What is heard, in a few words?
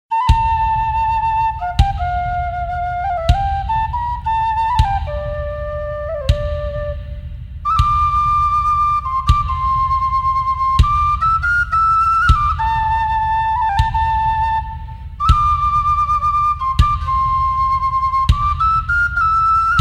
flute